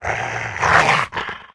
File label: ice hound attack, monster